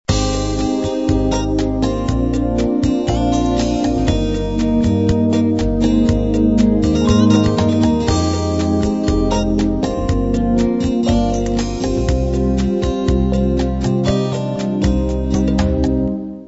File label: unplugged 8beat intro